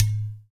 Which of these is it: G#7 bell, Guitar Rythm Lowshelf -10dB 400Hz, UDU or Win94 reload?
UDU